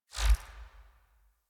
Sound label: UI Default Theme Grid Open; Warframe